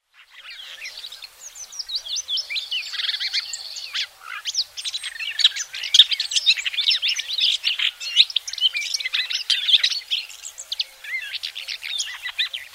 red backed shrike

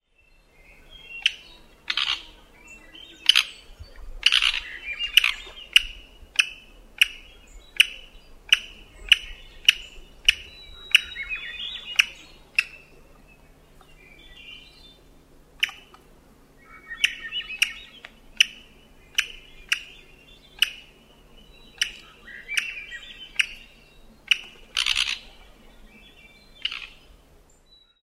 black backed woodpecker

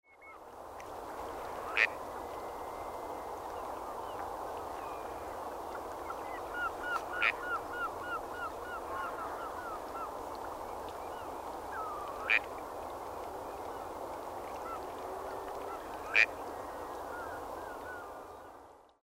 gadwall

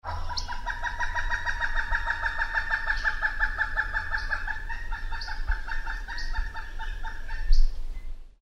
pileated woodpecker

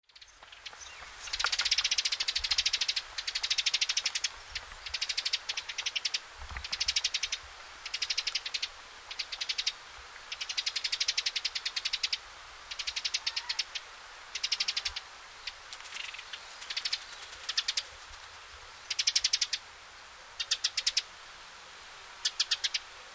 tree sparrow

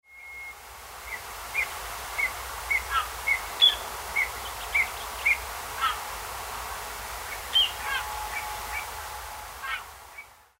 little ringed plover nocmig

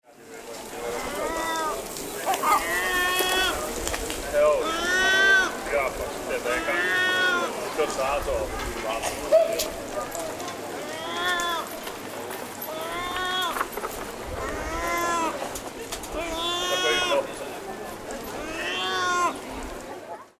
yellow legged gull

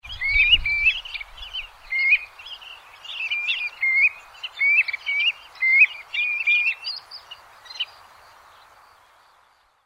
ringed plover

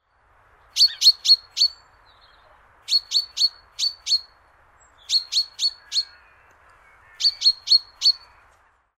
chaffinch

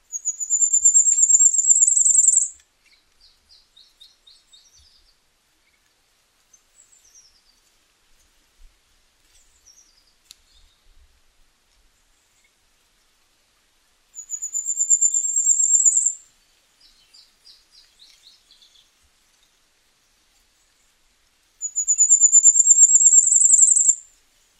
firecrest

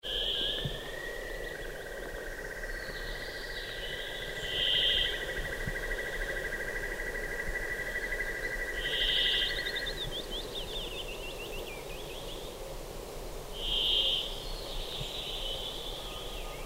whimbrel

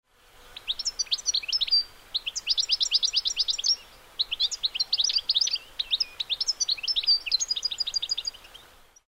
goldfinch

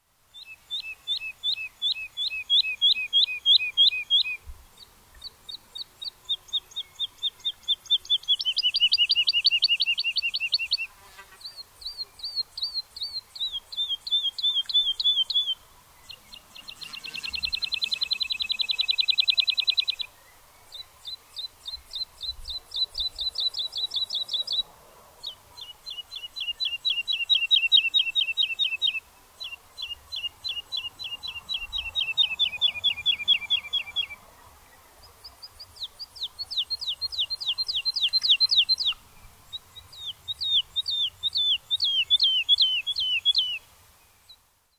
woodlark

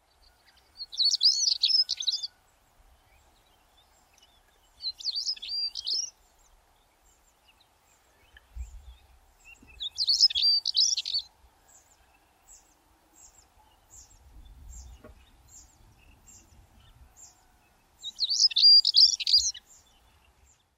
stonechat

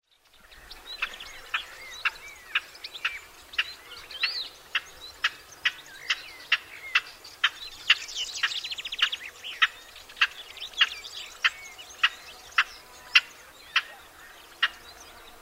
barbary partridge

